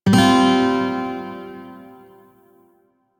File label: Shawzin Pent Min Chord G; Music; Warframe